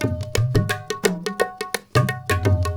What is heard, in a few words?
INDIA L, IND ENS PH, Heart of Asia; Spectrasonics - Heart of Asia CD2